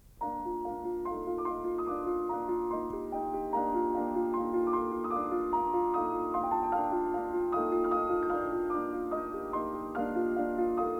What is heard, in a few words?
Variations and Fugue on a Theme of Handel, for piano, in B flat major; Brahms